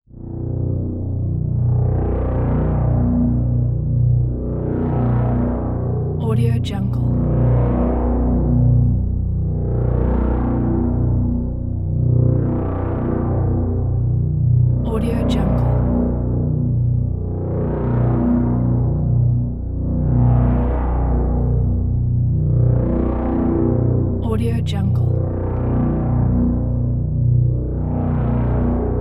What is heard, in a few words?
pulsating ambience